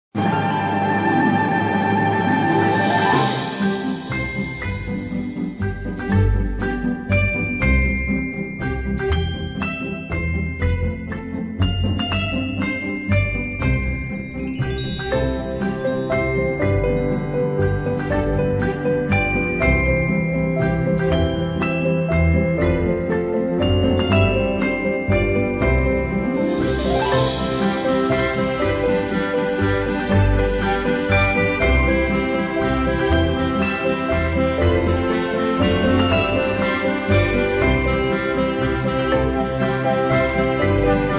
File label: Birthday Party